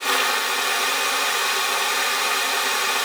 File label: WEQS E4, ALTOSAX; Xsample VOL-2